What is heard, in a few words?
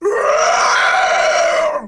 pain